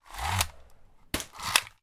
toy helicopter pull cord on launcher and launch helicopter hits object launcher cord; release